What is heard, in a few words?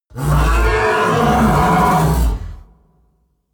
coloss roar loud deep; kreaturen